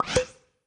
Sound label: message sent; scoponepiu